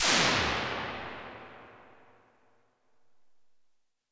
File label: diffusor, Impulse Responses